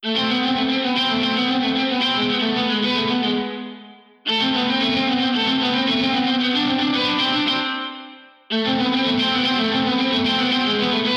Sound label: Dist Viola; FMOD Example